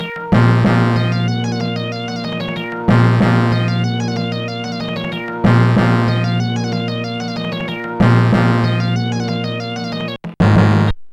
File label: boom boom; MusicLine